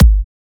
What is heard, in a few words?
Bassdrums; Vengeance Samples